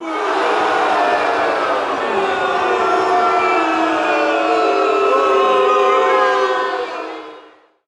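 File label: boxing crowd small boo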